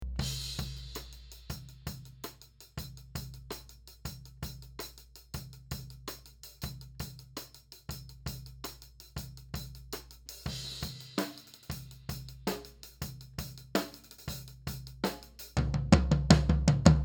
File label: Section A Tom